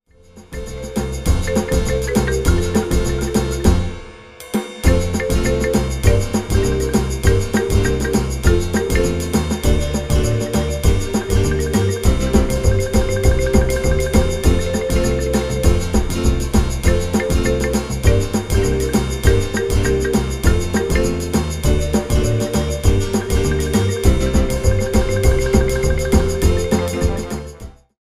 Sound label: No lead trumpet